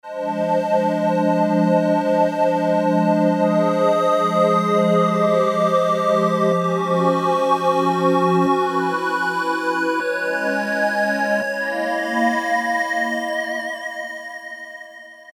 Light Pad, Korg MS2000; Factory Patches

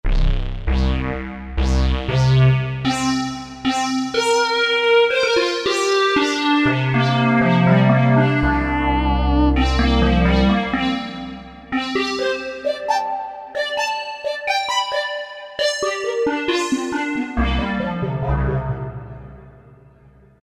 Fun Delay Square, Kurzweil K2000; Kurzweil K2000 V3

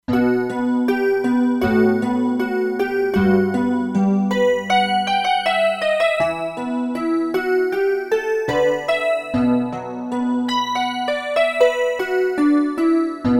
Cimbalon, E-mu Proteus-2; Factory Presets

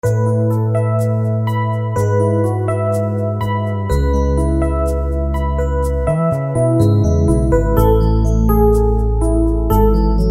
EP no Demo, Yamaha YPT-400; Factory Songs

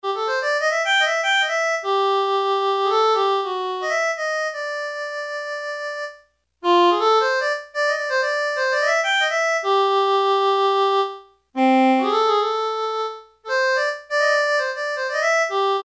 Yamaha PSR-300 HARMONICA; Voices

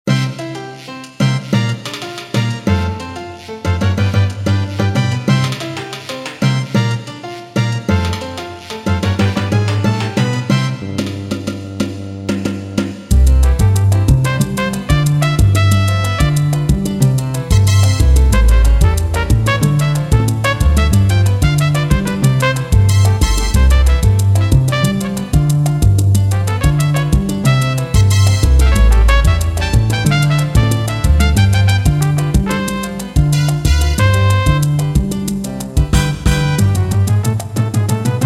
Casio CTK-601, Rhythms, Salsa

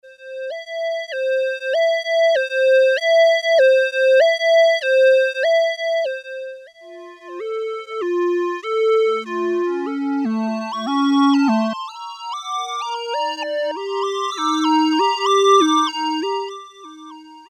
AMBULANCE, Casio Rapman; Tones

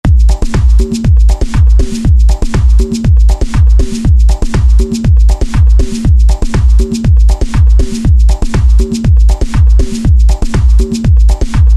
Crossfader, Roland SR-JV80-11 Techno Collection

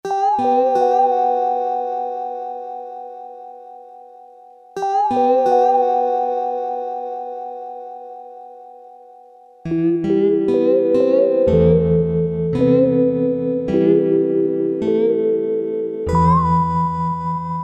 syn - PiPa Bend, E-mu Proteus FX; Factory Presets

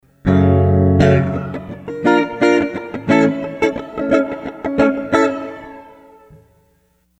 Flang Gurgle; Sony HR-GP5

